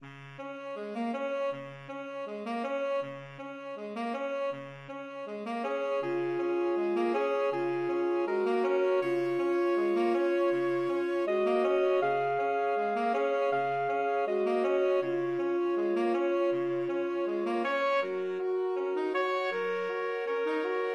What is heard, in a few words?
worried man SAX QUARTET